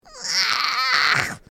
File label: brat noises